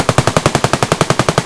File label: ak74m outdoor far loop; Escape from Tarkov